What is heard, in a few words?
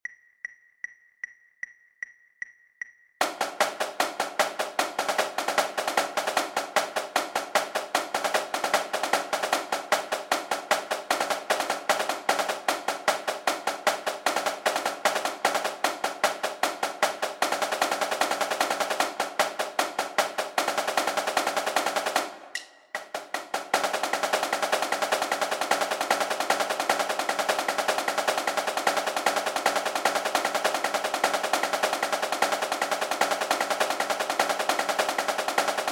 sequence para snare